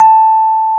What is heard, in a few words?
A 4, Electric Pianos; Wizoo Electric Pianos